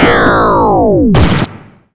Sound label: death